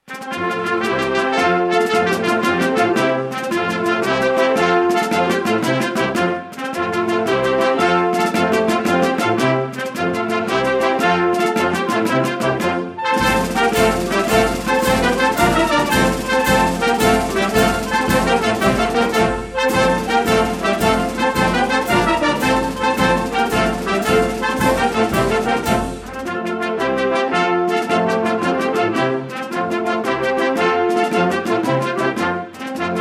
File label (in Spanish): Himno San Marcial Banda; Alarde; Irun; La música del Alarde